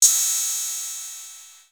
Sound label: Cymbal